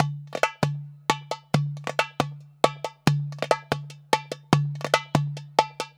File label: DERBUKA, Balkanish Traditions; USB Soundscan vol.63; AKAI